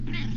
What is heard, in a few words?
falling trill